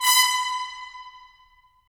BRS, Tps Stacc; Roland - LA Composer vol. 4

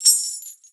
BELLS; Xsample VOL-9

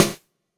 SD, dance drums hip

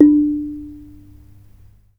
RANAT THUN D4; Spectrasonics - Heart of Asia CD2

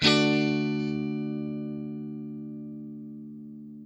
CHORD LN M, guitar; Quantum Leap - Guitar & Bass

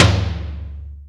PWR TOM; Back In Time Records; Big Bang

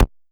Elektron Monomachine, Drum Machines

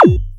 Pulse Kick; Cwejman S1; 300 Drum Machines; DevSnd Cwejman S1 - JK

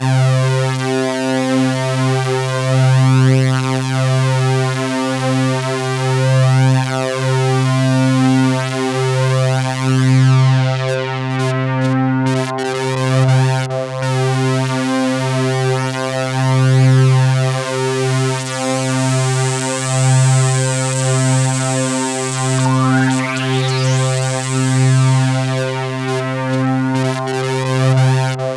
C2, Roland JV 2080 CLUB ORGAN

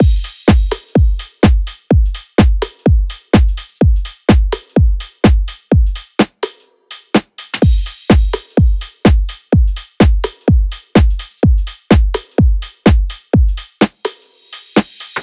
loop full 126; Seismic Loops

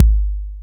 TR-808 Kick

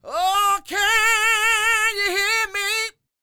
SING, Vocal Planet Blues Soul BLUESY

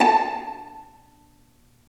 Vas Pizz; STR Vlas Pizz

